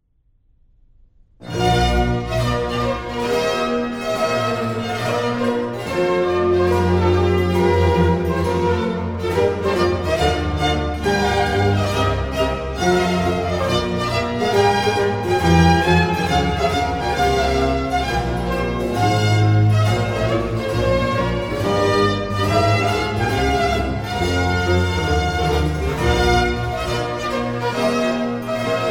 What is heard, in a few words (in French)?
Ouverture; Charpentier; Medйe